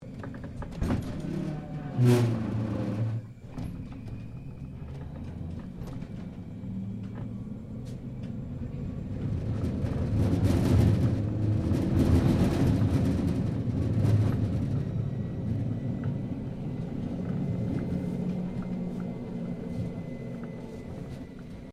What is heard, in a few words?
Tram Melbourne W Class Interior Starting Rattling; Melbourne Trams; Streetcars; Vehicles